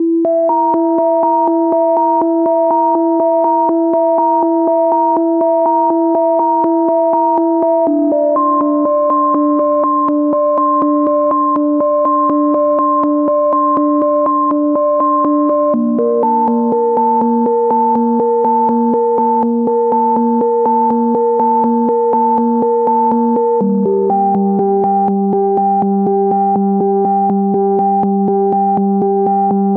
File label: 122 BPM Melodic Arps D maj C min Tonic G; MMB